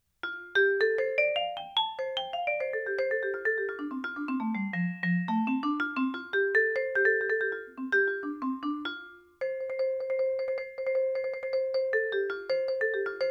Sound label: vibra, vibraphone; baguette vibraphone benoit lavollee jazz